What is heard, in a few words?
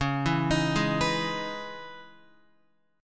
C sharp Minor 9th C sharp Guitar; C#m9 arpeggiated